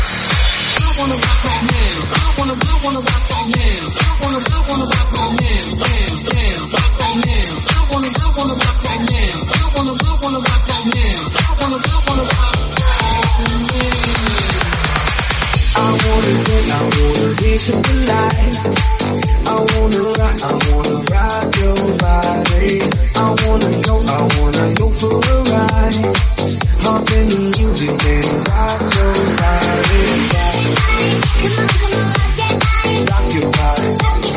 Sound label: 130bpm, Am